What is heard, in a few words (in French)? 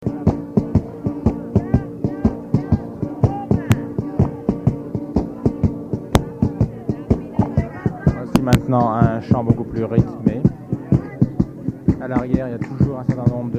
choeurs, wallis futuna; sigave